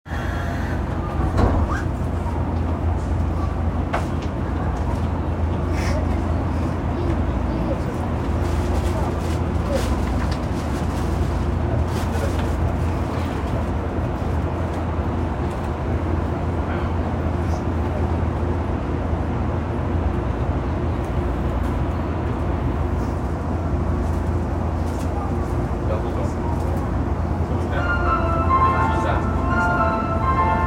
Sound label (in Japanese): 日比谷線銀座南